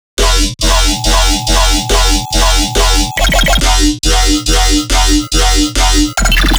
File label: Riddim Bass Loop F 140; Spade; Ghosthack; Riddim Essentials Volume 2